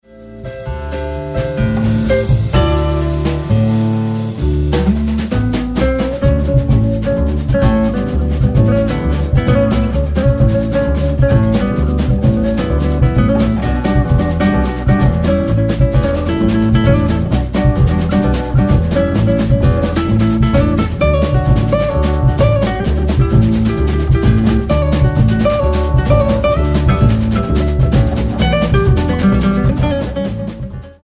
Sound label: Bossa Samba, Guitar